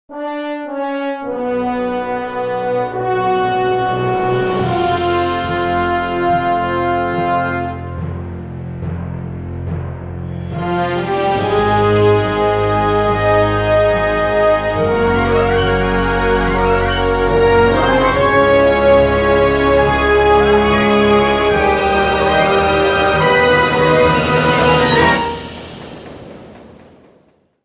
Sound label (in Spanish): orquestal heroico